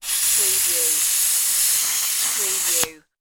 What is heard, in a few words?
air compressor release; sound effects bible